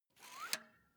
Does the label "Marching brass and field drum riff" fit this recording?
No